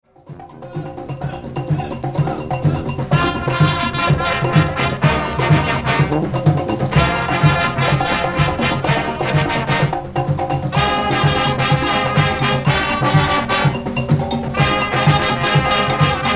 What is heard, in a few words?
fanfare